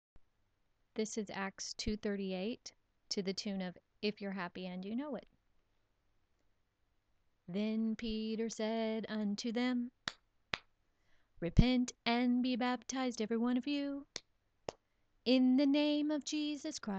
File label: song